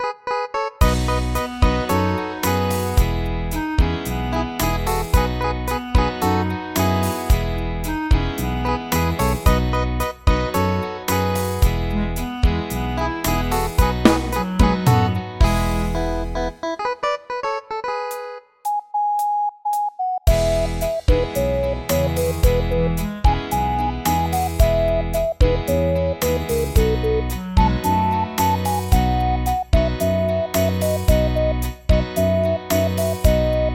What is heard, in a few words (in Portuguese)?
Viola Cabocla